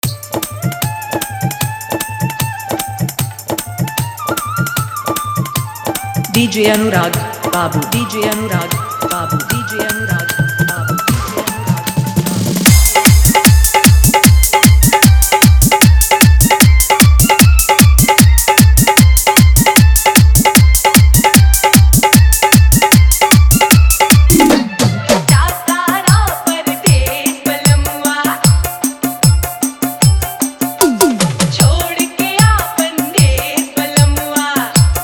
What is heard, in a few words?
Bhojpuri Hit Dhollki Mix